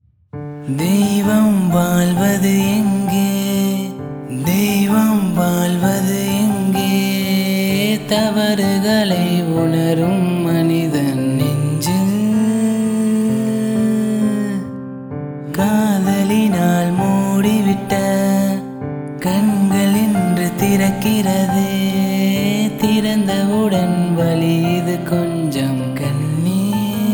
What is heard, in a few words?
Piano Unplugged